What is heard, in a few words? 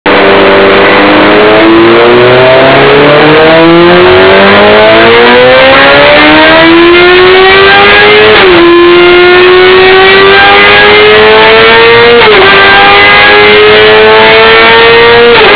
leo vince gp pro evoii slip on exhaust kawasaki zx6 r